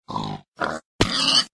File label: pigs minecraft